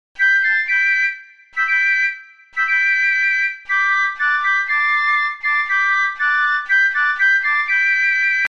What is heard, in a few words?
tango; Basler Märsch